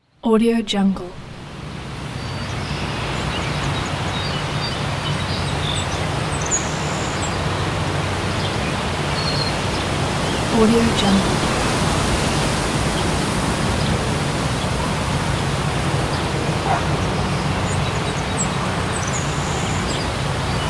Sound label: city park